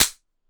Slap; rimworld-animations

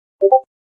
ping

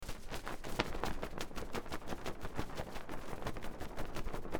flag wave; preedom flags

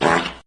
fart

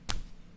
Clap; rimworld animations

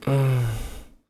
Moan, MVoice

